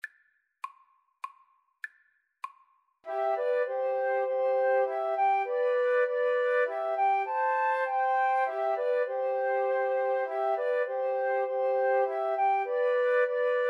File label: come thou fount, 2 Flutes Clarinet